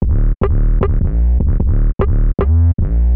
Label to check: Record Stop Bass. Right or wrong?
Right